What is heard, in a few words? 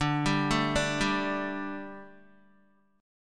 D Suspended 4th D down Guitar; arpeggiated